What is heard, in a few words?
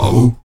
VOX AHOO, Afro Chants, Stacc Ah Oos